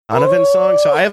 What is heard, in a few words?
ooooh